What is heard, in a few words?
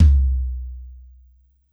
Jazz Tom 1, Yamaha PSR-E323, Jazz Kit